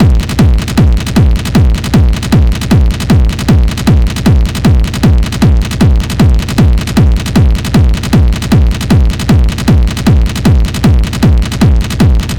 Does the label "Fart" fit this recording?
No